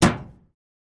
Model-t Hood, Open And Close; Hood Release, Single Metal Impact, Release, Pop Open.; Ford Model T